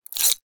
horror knife slice slash skin fleshy gore; zapsplat

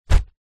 impact thud generic; zapsplat

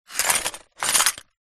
glass shards broken picture frame movement; foley

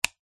household switch plastic flick

